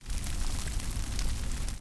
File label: fire; minecraft; Votive World